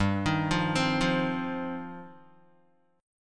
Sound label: G 7th Suspended 4th Sharp 5th, Guitar; Listen to G7sus4#5 arpeggiated; G7sus4#5 Chord